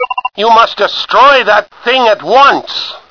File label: destroy; svencoop; echoes; agamemnon